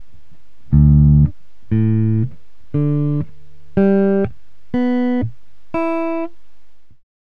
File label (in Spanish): afinacion drop D